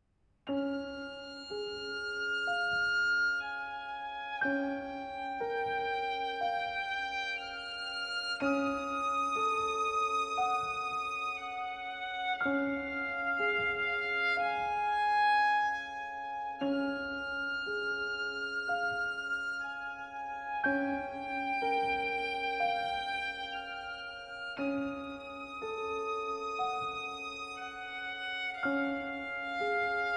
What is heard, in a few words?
preludes for violin and piano adagio sognando; 24 preludes for violin and piano op 46 no 15